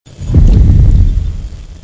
Impact Tremor

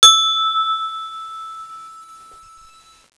chime

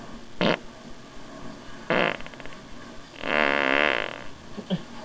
drippy, farts

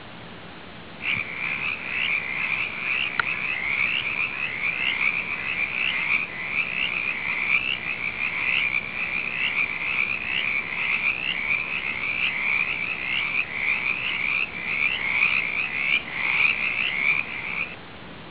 mountain chorus, frog

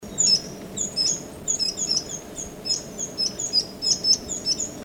Streak throated Fulvetta, birdcall

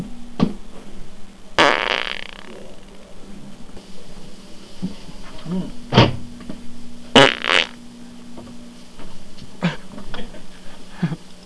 forced fart

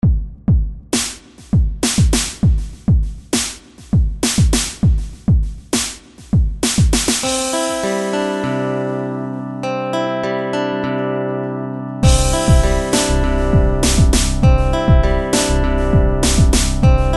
Melody

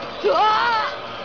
scream; japanese

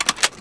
shotgun

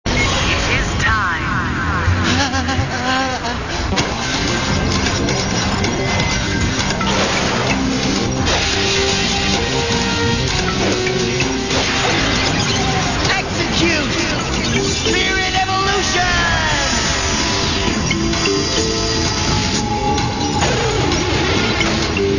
beetle

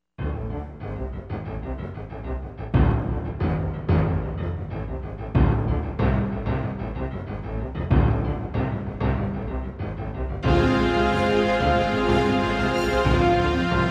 Fast Orch

